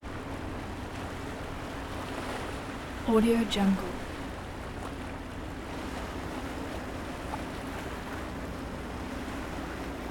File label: harbor ambience; prosoundfx